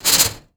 Maple Brush, snare; Drums; Ghosthack - Free Snares 2023

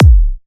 Kick; Psymon

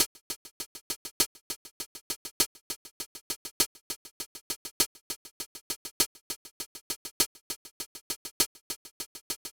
Gated Drum Loop - 100 BPM Hihat; Analog; Cymatics - ANALOG EVOLUTION Beta

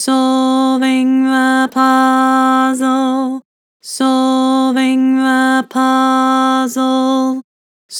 Vibrato, Vocals; Harmor Vocals

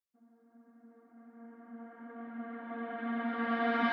Reverse FX; 122bpm A#min; Construction Kit; Ghosthack; Summer Freebie; Acapella Kit

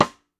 Steel Rim click, Snares; Ghosthack - Free Snares 2023

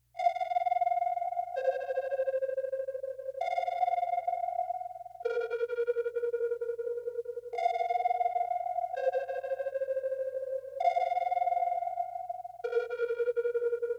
Dark Melody Loop - 130 BPM A Min Synth; Cymatics; Oracle Sample Pack

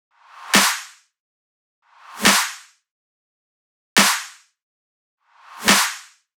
Riddim Drum Loop 140 Snare Clap; Ghosthack; Riddim Essentials Volume 2; Full Drum Loops

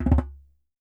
Djembe - C#; percussion; Cymatics - Infinity Beta Pack 2.0; Zeus Percussion Collection

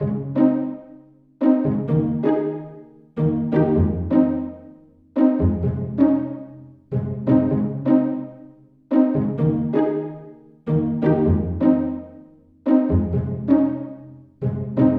String Loop, 128 BPM G# Min; Instruments; Loops; House; Scarlet

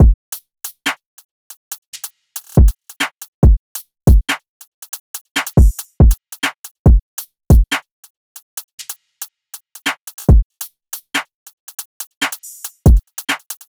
Drum Loop 140 BPM; Cymatics; Mystery Pack Vol 4; Sturdy

